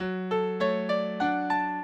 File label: minuet, mozart